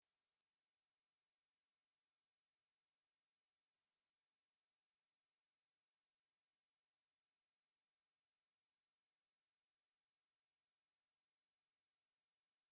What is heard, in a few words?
cicada; NZ species